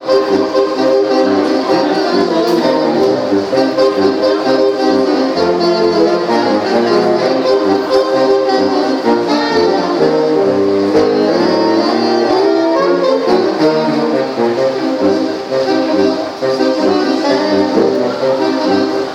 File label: saxophones; civic